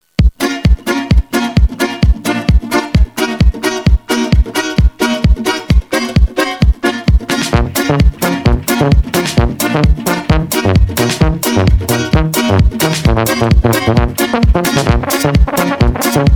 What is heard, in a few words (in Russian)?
весёлая музыка